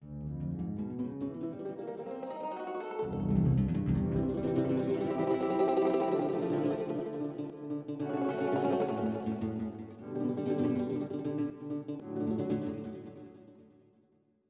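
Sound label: Soft Tremolo, mandolins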